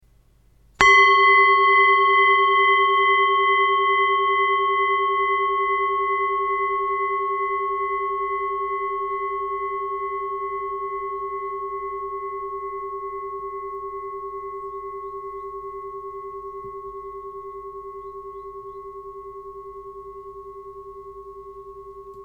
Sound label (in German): Klangschale